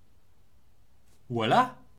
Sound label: shutter; cheese